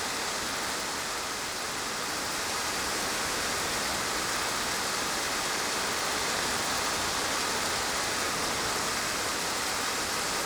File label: ambience desert forest night calm rainy; Rust